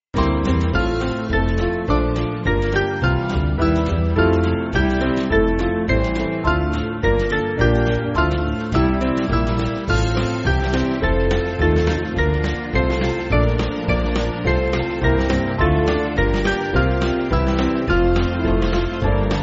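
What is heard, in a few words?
Band, Backing Files for Churches; Cromer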